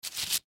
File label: Surgical Tool Pick Up Acrylic Paper; Hospital Sound Effects; Towel Surface Surgeon Surgery; Emergency